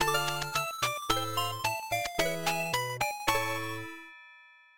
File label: Game Over; Job the Leprechaun